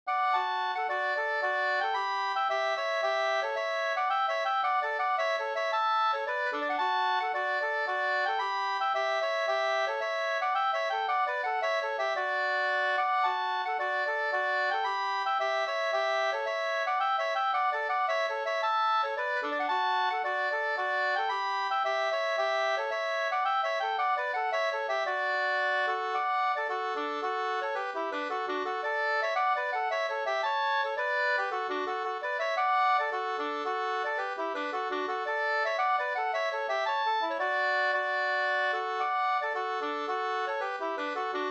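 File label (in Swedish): polska, tvåstämmig; Hogdal; Artur Lundberg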